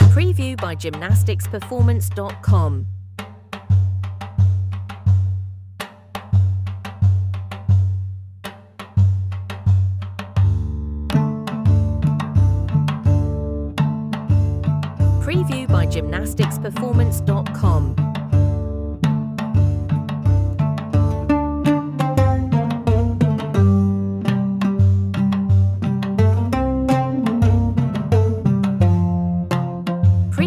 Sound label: egyptian tribal dance music